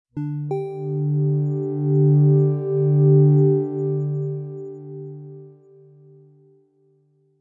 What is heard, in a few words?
calibration beep